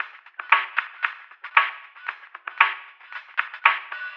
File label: Lo Fi Fonk 115, Lounge Drumz